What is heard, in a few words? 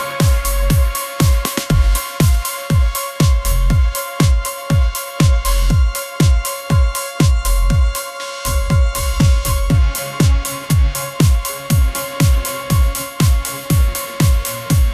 upbeat pop melody, ringtones